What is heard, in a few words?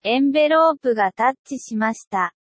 keys envelopes sound